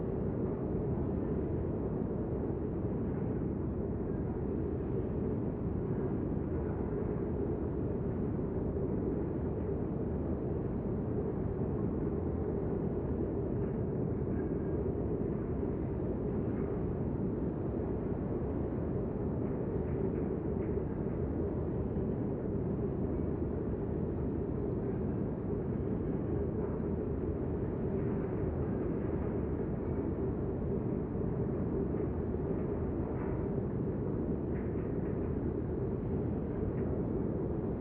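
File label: ambience interior industrial; Titanfall 2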